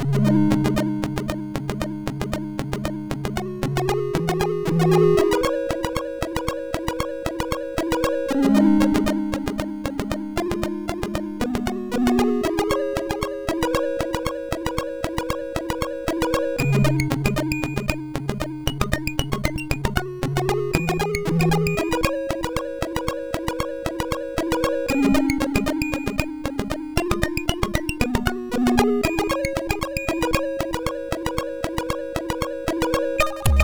Pond, MOS6581R4

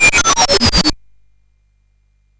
Synth Sample, CSG8580R5

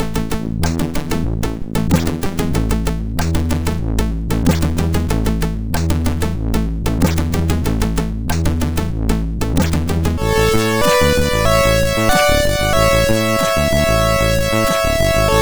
A-dur sid MOS6581R4

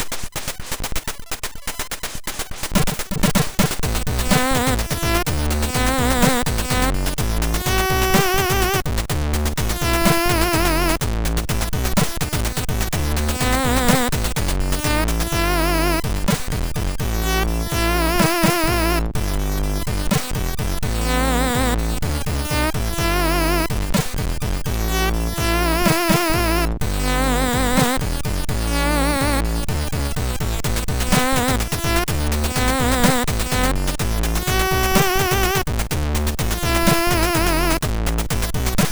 Jazz, sid MOS6581R2; hvsc

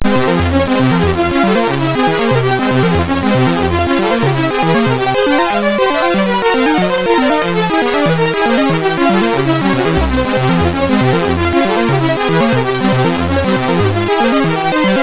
Atari ST sid MOS6581R2; Colossus Chess

